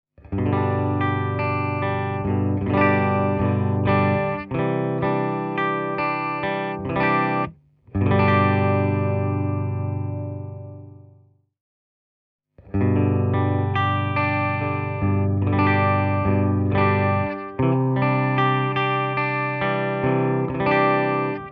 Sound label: bluetone fried eye 22 reverb clean channel plus boost options; Hamer USA Studio Custom -kitaralla; Low (kuiva) –> Low (kaiulla) –> High –> High + FE Boost –> High + FE Boost + FX Boost